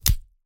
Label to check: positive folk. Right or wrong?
Wrong